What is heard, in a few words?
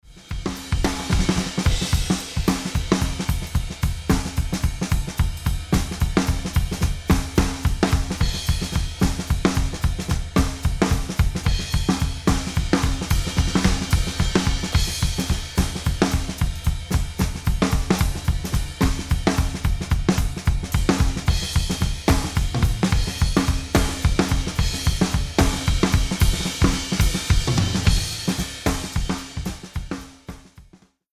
Mix Drums HH Ride